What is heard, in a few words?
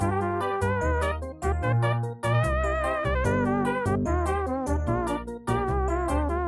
Elevator Jingle Loop; lethal company